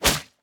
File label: Grain fail; Folk Hero